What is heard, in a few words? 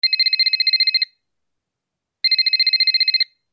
landline